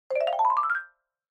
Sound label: Xylophone Slow Long Up